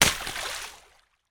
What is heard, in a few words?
nature water splash log throw into deep puddle